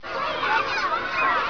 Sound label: short kids play